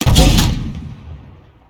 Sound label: shoot; tacz; RSWS 1903